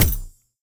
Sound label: explosion sparkle; Epic Toon FX